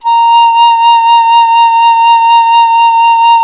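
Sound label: FLUTE PICCOLO A; Miroslav Vitous - Solo Instruments VOL-2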